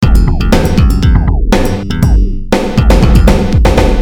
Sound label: depressing rock music